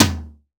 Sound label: TOM, DRUM KITS; InVision Interactive; 40 Oz Phat Slammin Hip Hop